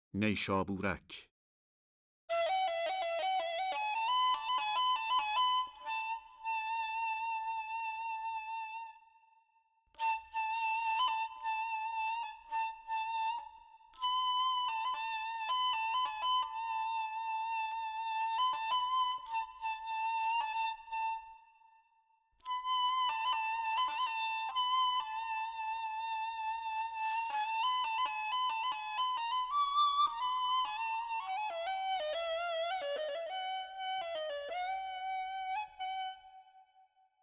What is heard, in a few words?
Neyshaburak Nava Ney; Radif-e Ney; Dastgah Nava